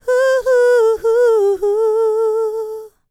LICKS, Vocal, R&B; ILIO; Dance; LT R+B

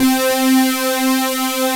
PWM SYN; Pro-Rec - Synthsonic

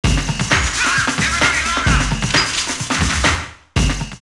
129, drumloops Techno&House; The Low Down; X-Static Goldmine

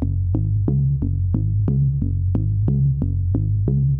SH101 Bass Loop (120BPM), retro house

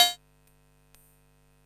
COWBELL, drum kit; Polyend; Stazma & Concrete Collage; Concrete-55

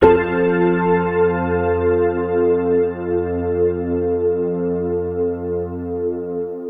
Big F Chord, Drum & Bass

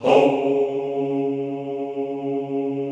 HOUUU, Classical Choir

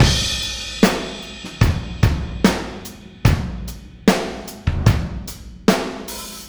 Verse, Full Mix, Drums; Sonic Foundry (Sony Creative Software); Drums from the Big Room The Mixes; Demo Projects

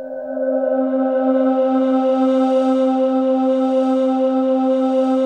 DREAM SYNTH; ATMOS

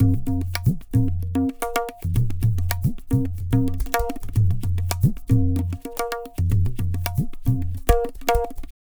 Tabla, 110 BPM; Zero-G; Pure Tabla

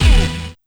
C5 "Uh!" + Hit, Yamaha PSR-E323 Sound Effect Kit; drum machine

